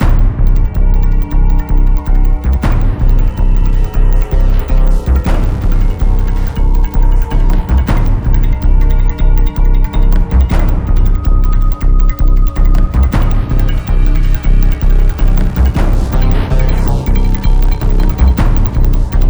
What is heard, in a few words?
Aircraft Carrier Combat